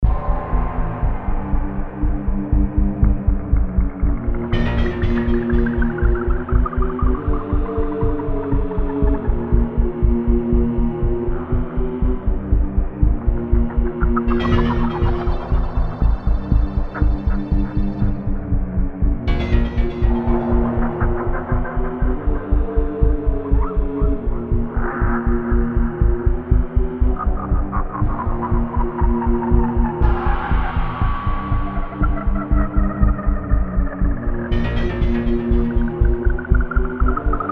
Deep Amazon - Ambience

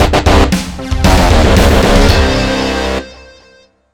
Objective Failed; Driver L.A. Undercover

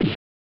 Hit

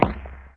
thump spinner shot; gonner linux macos ps4 switch windows xbox one gamerip 2016

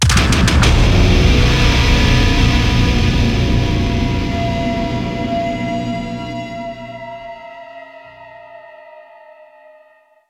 Failed; modern combat 4 zero hour; android

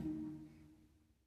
Loop Tail; Good Job

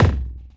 enemy death

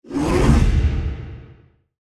Ui Illuminate

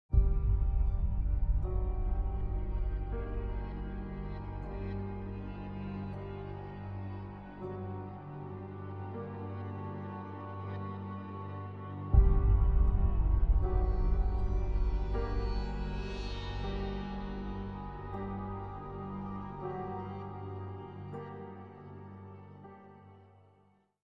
Fail Timer; The Embassy - Epilogue

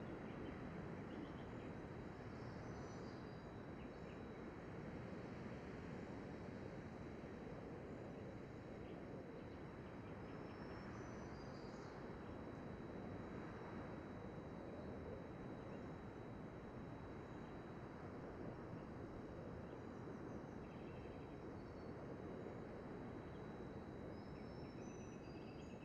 amb luncheon indoor; chicory a colorful tale; ps4

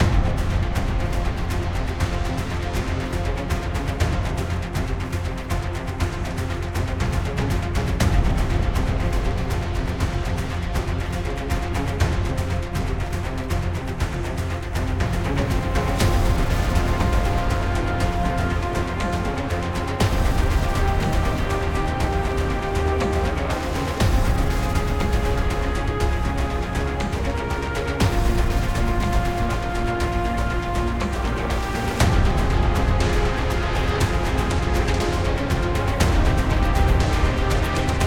Combat Music; XCOM Enemy Within

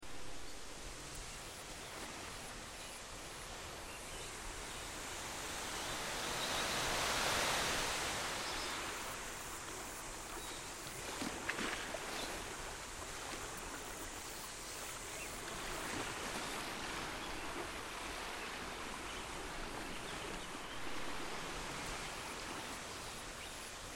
Lake Ambience; udraw studio

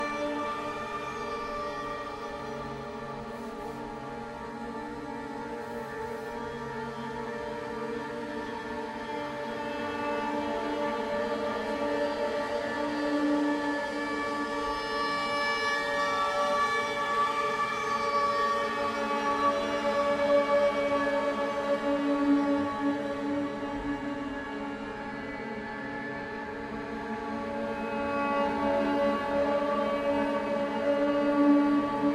SE Strings; Solus Project

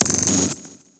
down hard

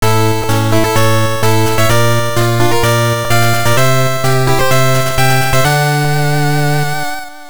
All Clear; Mega Man III; Rockman World 3; GB

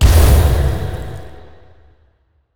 explode; light rune explosive

